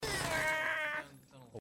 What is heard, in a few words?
waaaah